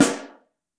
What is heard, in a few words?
SN3 Mono, snare